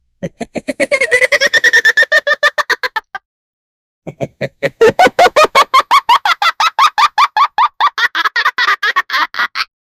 the sound of cartoon characters laughing uncontrollably